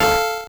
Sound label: core squish